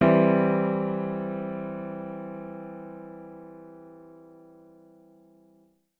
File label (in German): dur akk